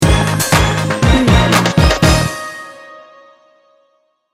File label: slinky beats sting bumper